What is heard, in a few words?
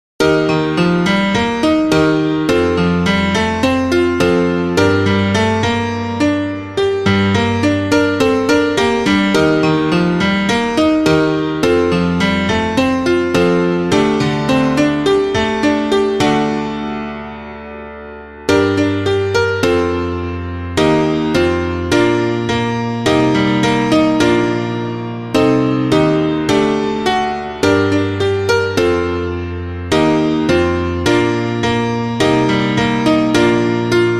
piano